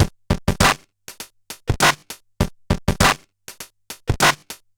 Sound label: Bent RX17 (100BPM), Circuit Bent